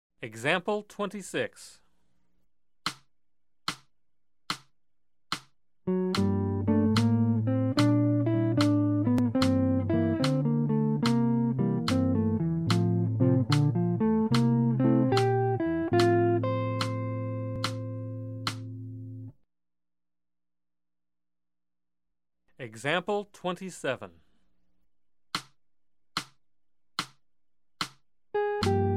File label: Jazz; jue shi ji ta